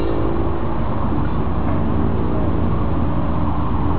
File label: Blimp